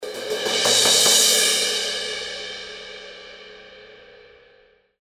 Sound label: K Con Crash Build Crash, cymbal